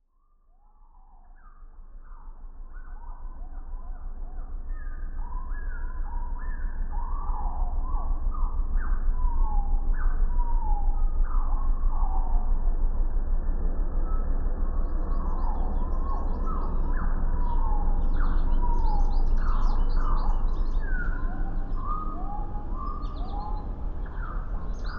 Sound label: birds and guitar